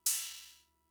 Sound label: Cymbal, Fricke MFB-522, Drum Machines